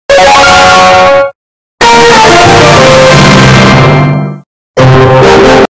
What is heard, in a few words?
bass boosted; clashroyalntro